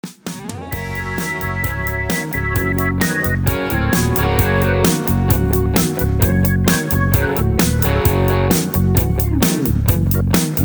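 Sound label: no leads and no background vocals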